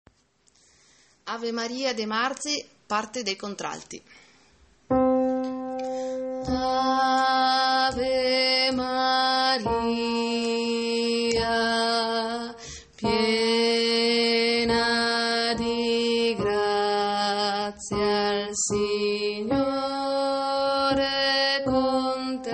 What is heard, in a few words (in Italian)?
ave maria contralti